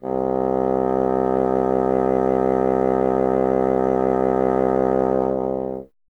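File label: wind bassoon C; Miroslav Vitous - Solo Instruments VOL-1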